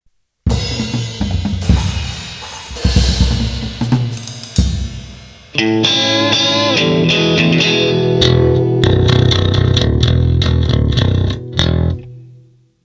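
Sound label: barabani gitara i bas, drums guitar and bass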